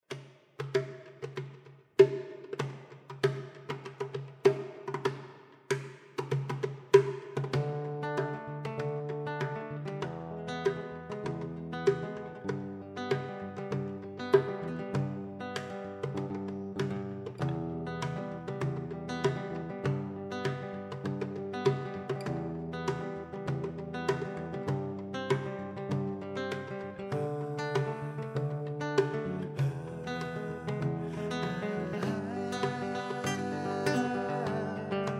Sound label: Acou